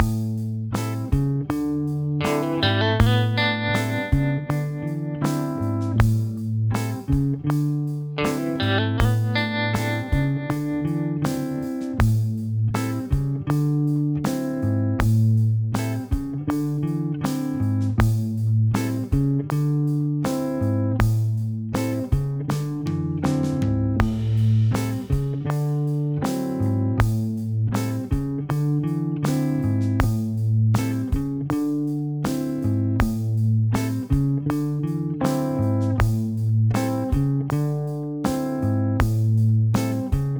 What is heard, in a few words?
Jazz Backing Track